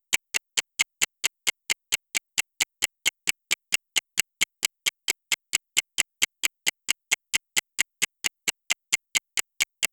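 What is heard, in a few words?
create a countdown, timer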